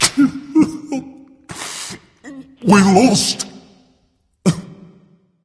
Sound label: boohoo berserker; cuchulainn